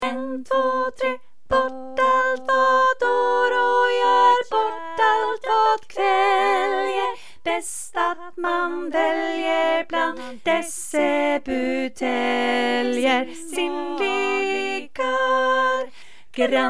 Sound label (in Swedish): Bas, seniorkor; korsang